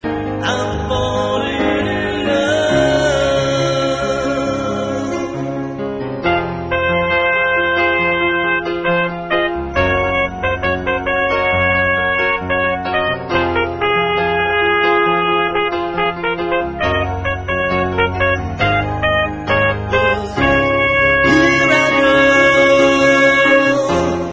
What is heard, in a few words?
solo, midi sounds